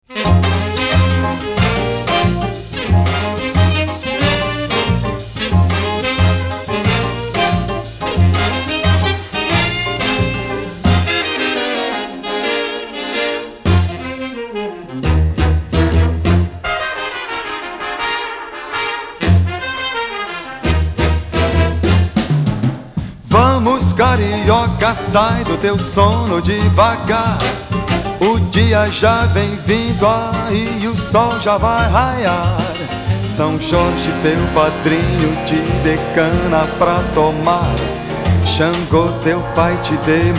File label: Samba